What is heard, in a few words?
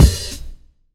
big kick one shot E key; Duration 0:01; kick drum samples